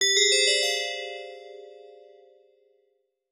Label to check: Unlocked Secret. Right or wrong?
Right